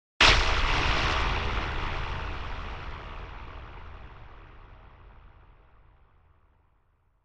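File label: Hit Electric Static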